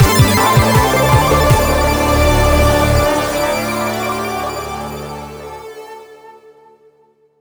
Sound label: Mus Combat Player Win; Space Tyrant